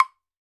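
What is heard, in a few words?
low block; E-MU Producer Series Vol. 7 – Old World Instruments; Drums&Percussion; Salsa Kit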